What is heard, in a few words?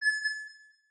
Smartphone Notification Sounds; aXLsound